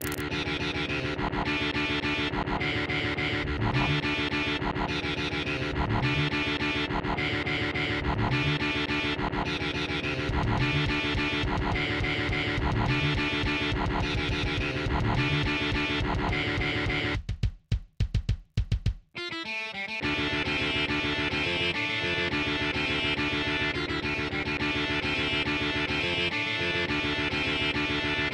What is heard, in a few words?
Guitar; Pacific Rim; movie ost